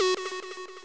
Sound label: sub lead f#3